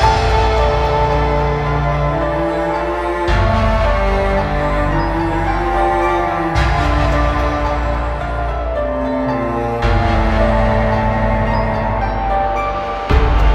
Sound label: avg dignified loop, music